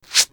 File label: woods knitting; juniper